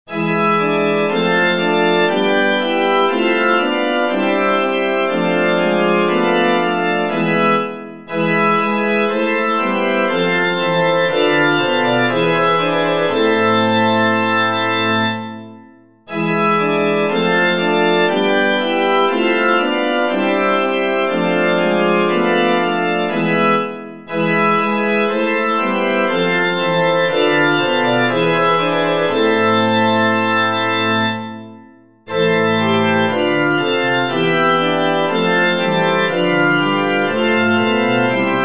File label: chorus for organ; bach johann sebastian; wie soll ich dich empfangen